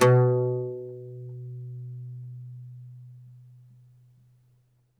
OUD B1 -L; E-MU Producer Series Vol. 7 – Old World Instruments

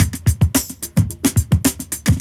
Loop, Roland; Sound & Vision - Gigapack I

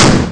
big snare; ST-XX Amiga Soundtracker Sample Packs

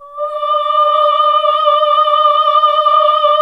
OHH D#3, CLASSIC VOX

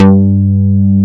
LOOP, Synth Bass, Roland

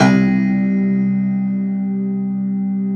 Rubbery Piano, prepared piano; Total Piano

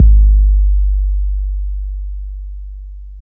kick deep; AMG; Now CD-ROM (Roland); Kicks

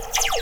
SPACEPHON; PlatinumPhattCD2; E-MU Producer Series Vol. 8 – Platinum Phatt; Flexis Etc

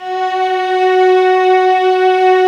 STR VAS, Vlas Sect, Amb mf; String Master Series; Vlas Sect3-4

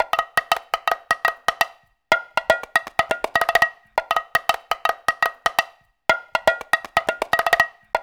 AFRO, African Contemporary, AfroTriangle; Spectrasonics; Supreme Beats

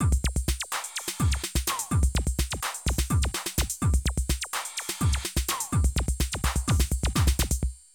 Drum loops, Euro techno

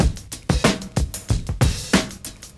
DRM CHOP 93; AMG Gota Yashiki Groove Activator

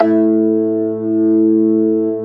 B3 HAM A2, organ; AKAI S-Series CD-ROM Sound Library VOL-2

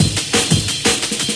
sniper, breaks

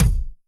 Kick, Yamaha RY20

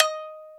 Shamisen D#4; Planet Earth; Digital Sound Factory Planet Earth

